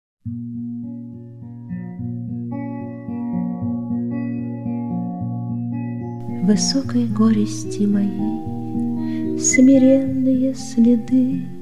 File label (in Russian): gitara, Высокой горести моей; Marina Cvetaeva